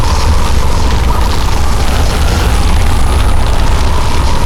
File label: Death Wall Loop; Evolve; Elder Kraken